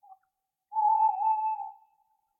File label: Tawny Owl; SyrinScape